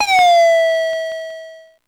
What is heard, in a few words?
Drum, Electro-Harmonix Spacedrum; Drums